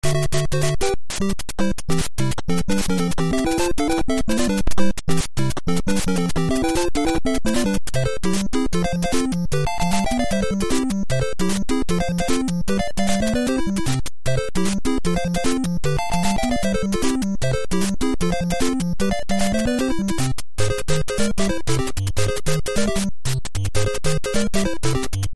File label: Overworld (Fast); Mari0